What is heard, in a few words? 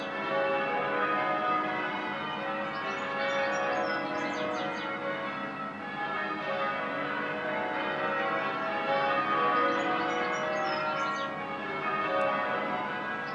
bird cathedral bells and gull